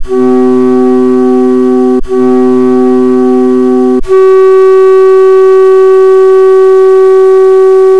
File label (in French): quintes